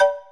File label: button press; Dora and Friends - Dora's Wonderful Wardrobe!